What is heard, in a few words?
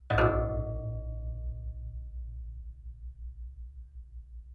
Bell; Percussion; Königstein/Taunus; St Angela; Christoph Collenberg